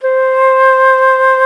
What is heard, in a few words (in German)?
FLOTE SOFT; FLOETE; Best Service; Alpine Volksmusik